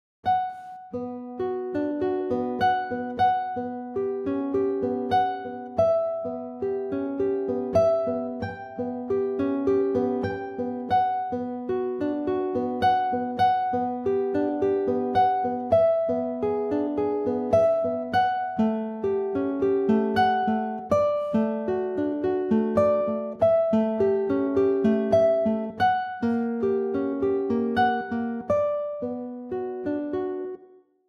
prelude, guitar; barrios; pianoteq8

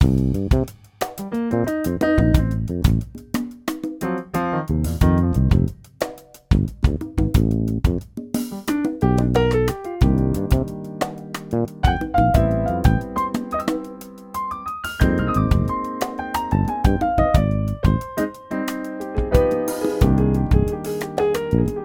Jazz Improv, model d; Onj's Jazz Improv; pianoteq8

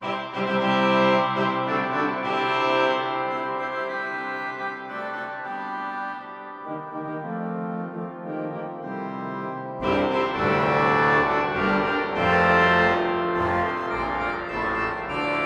Grand jeu, organ; organteq1

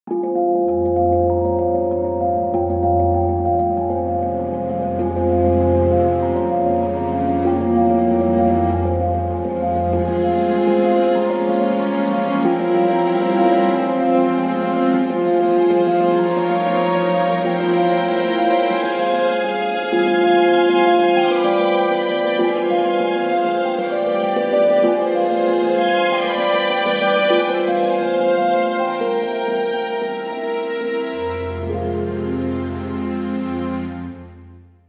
Tank drum cinematic; steelpans; pianoteq8

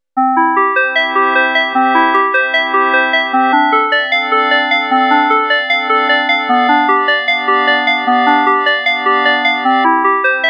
bach synth

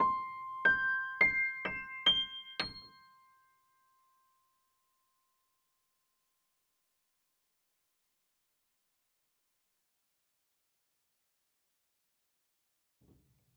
resonance harpe

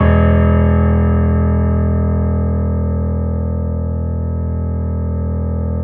Steinway E

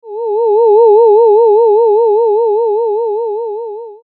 vibrato

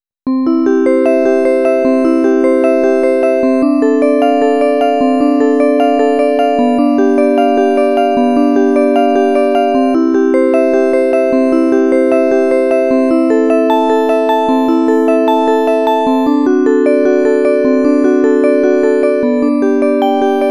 xylofen; bach

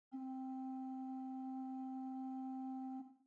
Bourdon, organ; organteq2

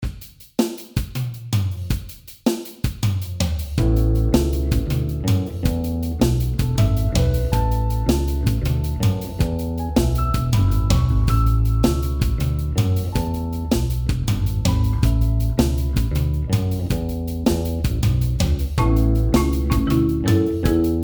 marimbee, xylo; pianoteq8

